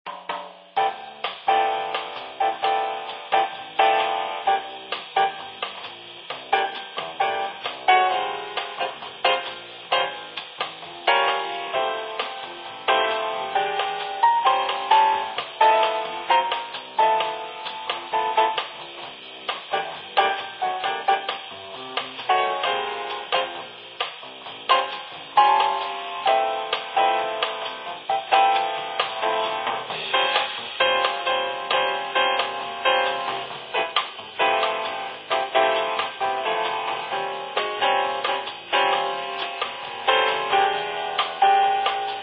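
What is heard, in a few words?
Music Ambient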